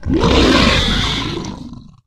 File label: flesh death